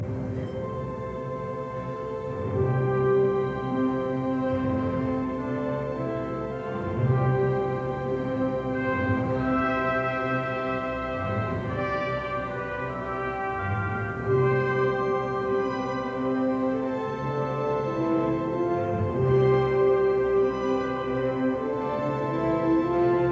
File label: Symphony in C minor Im Tempo des Scherzos; Symphony No 2; Resurrection; Bruno Walter